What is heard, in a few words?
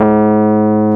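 keys Rhodes, electric piano; Super E.P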